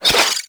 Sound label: Rising Blades Whoosh; Warframe; PaxDuviricus